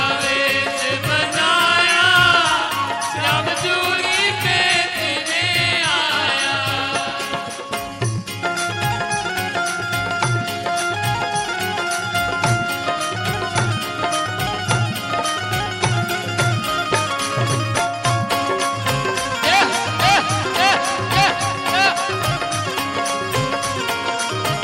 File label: bhajan ringtone